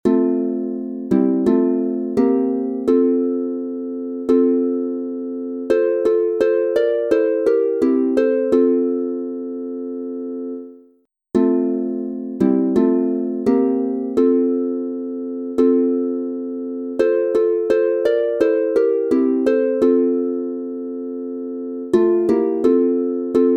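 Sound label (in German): F Dur 3 stimmig instrumental, Harfe; Marienlieder; Meerstern ich dich gruesse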